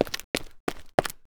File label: footstep